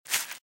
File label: Velociraptor - Footstep - Grass - Run - Left